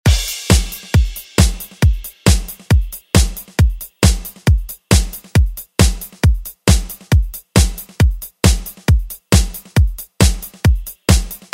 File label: 80s Re Drum; DJ Mhark